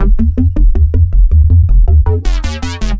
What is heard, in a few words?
160 sequenced subbass sixths, bass loops